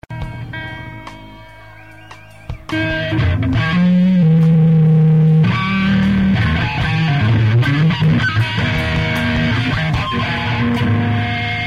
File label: Drum Dub